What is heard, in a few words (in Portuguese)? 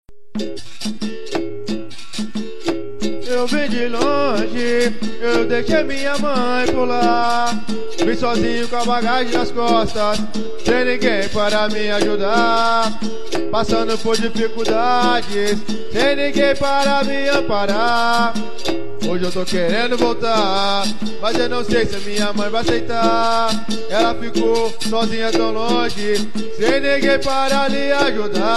capoeira; capoeira tribal